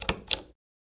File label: Light Switch Rotary; switches